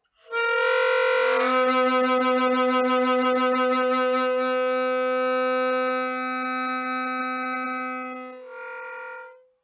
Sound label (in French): corne de brume